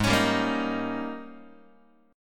Ab Major 7th Suspended 2nd Suspended 4th Ab Guitar 4A,4E,5A,5D,5G sharp; strummed; x,4,4,5,2,2; AbM7sus2sus4; JGuitar